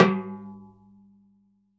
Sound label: Timbal Hi; Roland BK-5; Percussion; Timbales